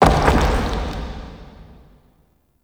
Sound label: Distant Stone Texture, Crypt Ambience; One Offs; Champion Crypt